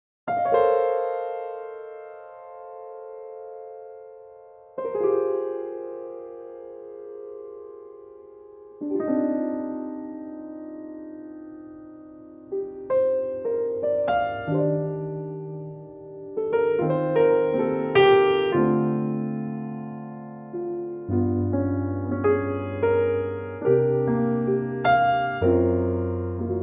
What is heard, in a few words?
Bb piano